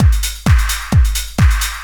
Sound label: dr t 130 trance 2; DRUMLOOPS; LOOP-TRANCE1; Techno Trance; Ueberschall; Techno Trance Essentials